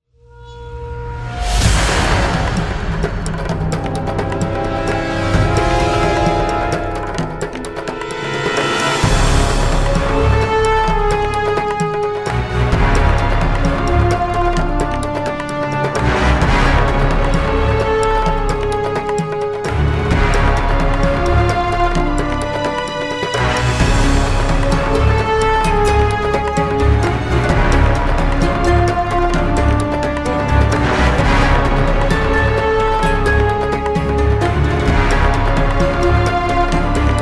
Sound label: desert battle